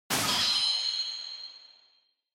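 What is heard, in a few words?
Onoma Syakiin Dry, シャキーン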